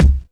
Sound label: KICK, Hip Hop